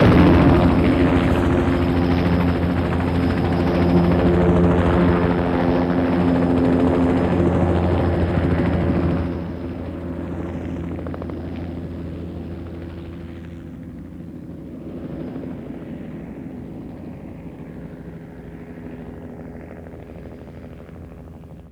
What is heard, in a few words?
Military Helicopter Idle Away; Sony Pictures Sound Effects Series; Vehicles